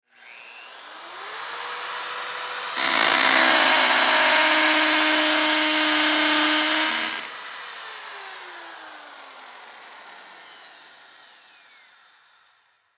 Hammer Drill Half Inch Turn On Impact Concrete Turn Off; Industry; Hammers; Blastwave